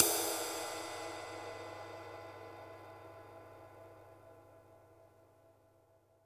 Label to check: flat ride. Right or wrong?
Right